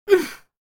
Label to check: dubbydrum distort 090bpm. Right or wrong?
Wrong